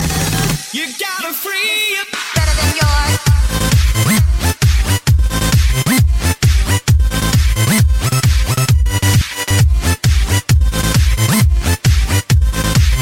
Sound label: Electro Mix